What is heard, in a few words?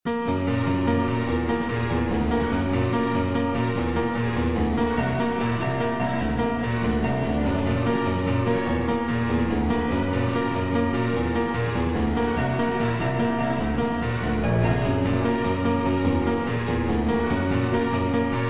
Combat Piano; Tachyon The Fringe